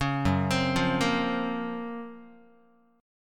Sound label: Gb Major Sharp 11th, Guitar; GbM#11 arpeggiated